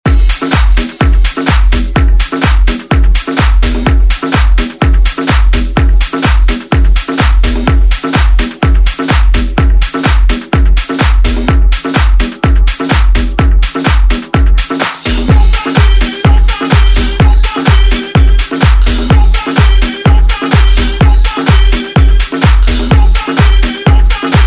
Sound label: Pop Dance Redrum